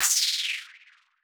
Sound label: FX, ambient, 160; eJay